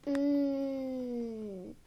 Mmm

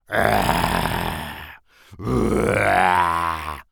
Human Monster

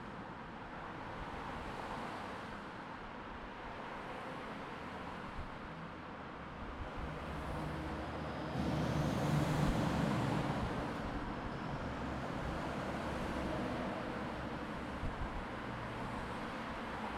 Highway

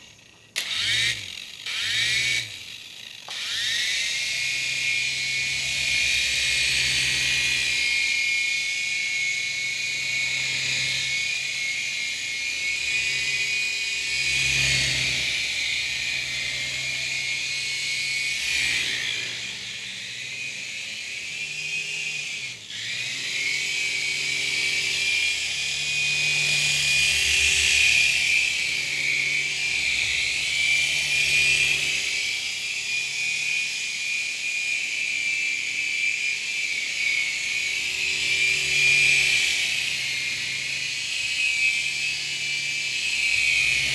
Toy Helicopter

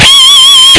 10th Fret Harmonic

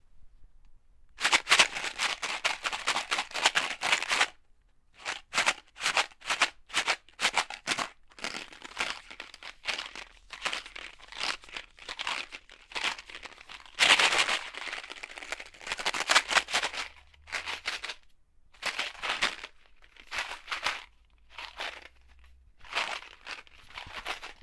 Candy Box Shaking

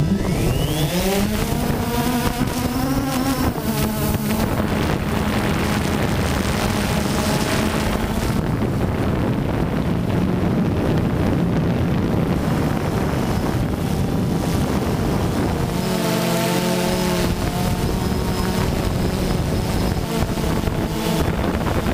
Drone Going In The Sky

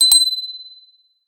Small Bell

